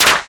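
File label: PER PROCU, Procussion, percussion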